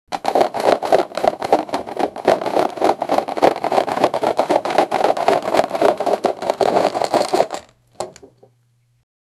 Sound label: the sound of a box